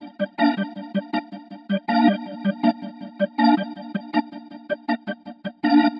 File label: 080 Roots Reg Organ; Digidesign; Pro Tools; Pro Tools Essential Demos; Essential Loop Demos; Demo Session - World